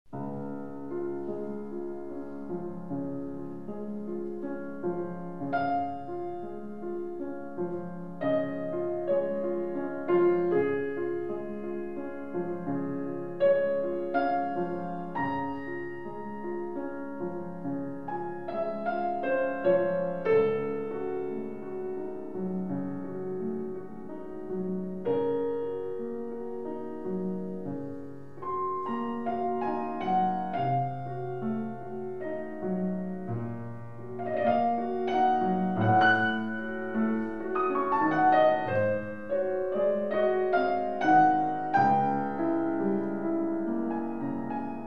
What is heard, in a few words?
Chopin Piano Recital Nocturne op.27 Nr.2 Pianist live